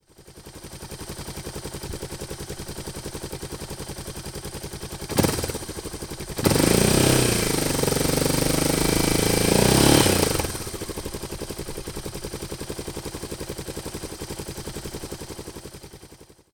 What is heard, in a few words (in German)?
Ohne Klingeln; DR650